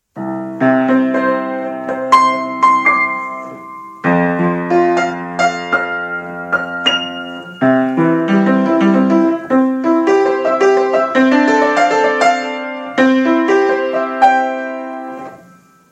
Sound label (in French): Piano moyen faux